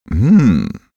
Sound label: male enjoying hmm; koerpergeraeusche; menschen; soundpool